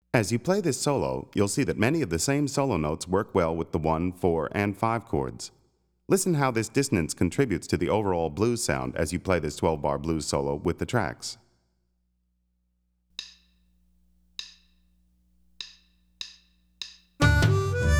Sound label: Twelve-Bar Blues Solo, harmonica; Play Harmonica